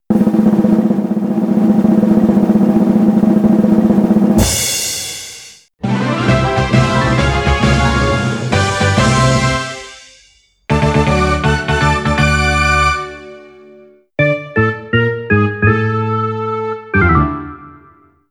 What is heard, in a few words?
Karaoke Results; Yakuza 4